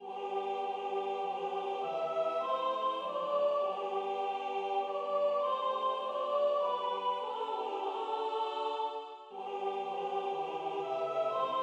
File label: Sopran